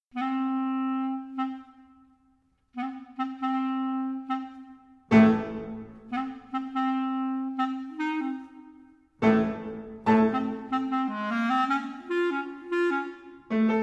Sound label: Jazz Dance